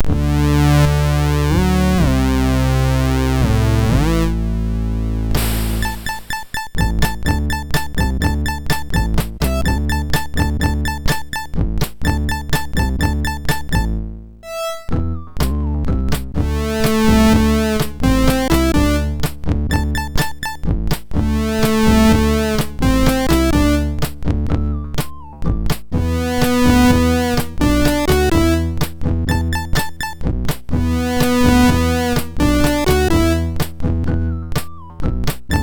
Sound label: MOS6581; MOS6581R4